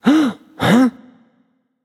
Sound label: loud sound; smite; voicepack; osiris